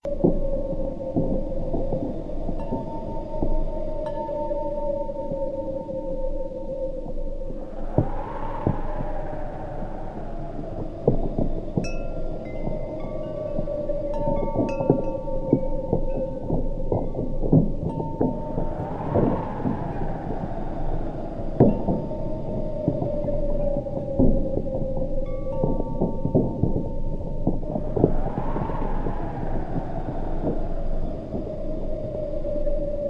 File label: Season4-Onfinnice Day Ambient